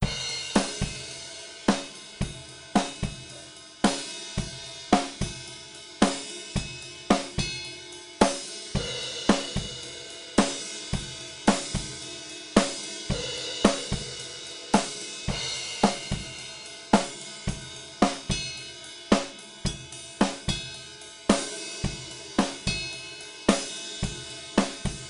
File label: Drum; MDBDrumsPlusPlus